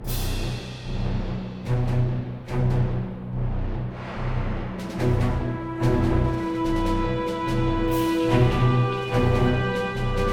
Combat Dogfight; Elite Dangerous

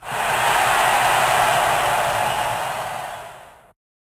Crowd

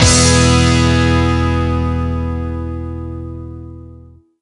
Music Dance End; game rip; littlest pet shop garden jungle winter; nds

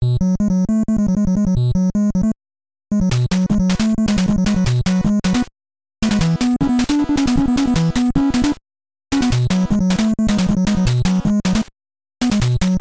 Timer Low; Moon Bonus

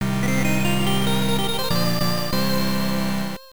Lose; F-1 Dream; TurboGrafx-16

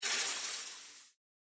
Smoulder

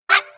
Lazer Collision; Angry Birds Space

